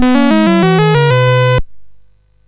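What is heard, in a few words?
Number Tumbler, MOS 6581 SID chip music, games; hvsc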